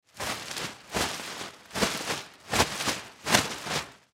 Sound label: Trash Bag Shake, Trash Bag Shake Open Hard; Garbage; Household; Blastwave